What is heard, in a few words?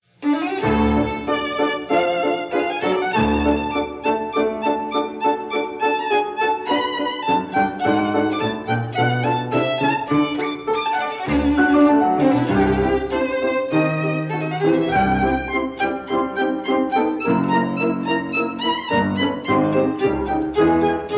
Sonata in D major